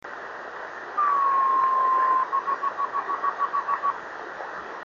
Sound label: dots 26770KHz